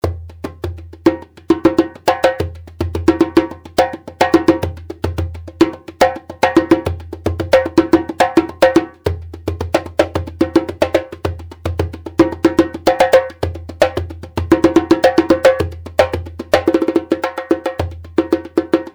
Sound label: spicy djembe